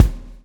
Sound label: SWNG